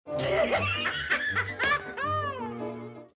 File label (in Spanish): risa